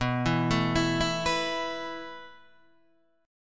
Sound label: B 7th Suspended 4th B down Guitar DADGAD